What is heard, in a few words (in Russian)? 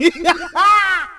smeh